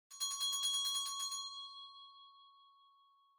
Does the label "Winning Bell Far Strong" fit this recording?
Yes